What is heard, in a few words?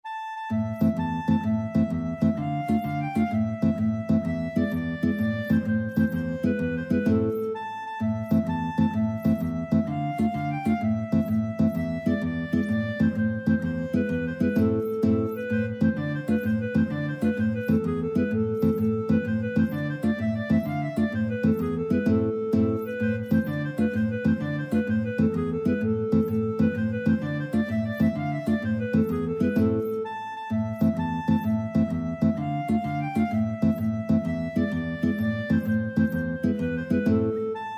tarantella clarinet guitar